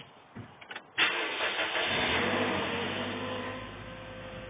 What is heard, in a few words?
toyota corolla normal start up sound, rattle